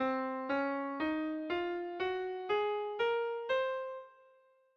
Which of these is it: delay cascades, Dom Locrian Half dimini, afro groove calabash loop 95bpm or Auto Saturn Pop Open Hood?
Dom Locrian Half dimini